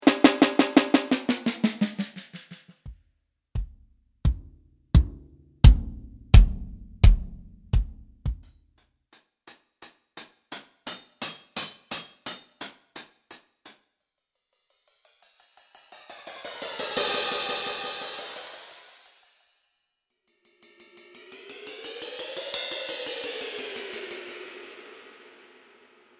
Sample Rack Tune

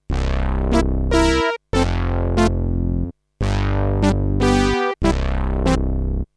Alesis Concert Synthesizer

